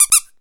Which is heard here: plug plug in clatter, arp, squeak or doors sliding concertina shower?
squeak